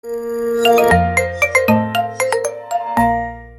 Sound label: Whatsapp Nokia